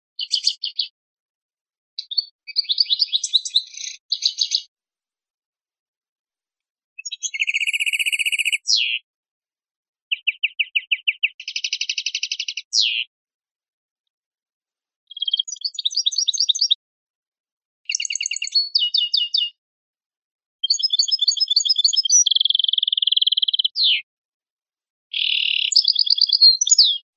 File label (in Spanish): penalizaciones notas gorrion pardillo verderon, campero